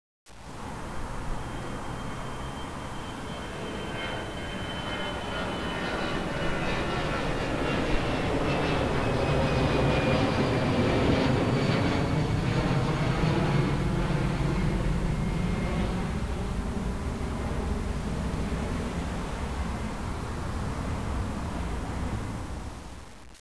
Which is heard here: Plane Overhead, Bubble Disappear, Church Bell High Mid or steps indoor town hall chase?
Plane Overhead